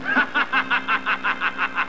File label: LAUGH